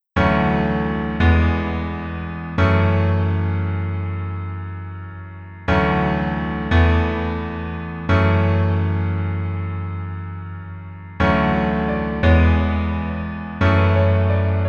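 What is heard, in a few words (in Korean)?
Piano version 멜로디 MR -1키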